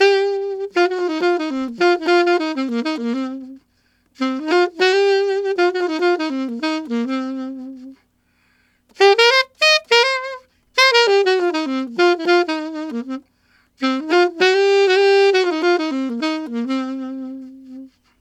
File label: Alto RnB C min, Sax; Sonic Foundry (Sony Creative Software)